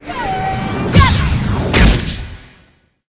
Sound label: dark mage attack